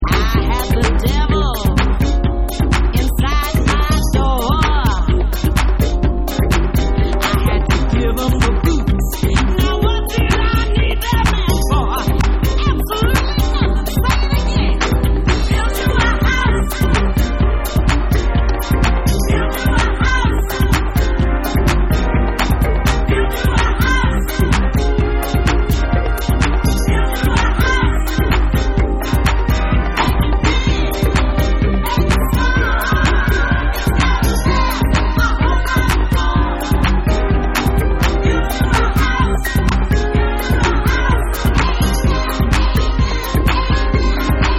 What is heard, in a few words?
HOUSE Tribal Jazz Mix